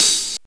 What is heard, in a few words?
muffled open hi hat a sharp key, open hi hat sample tuned to the A# note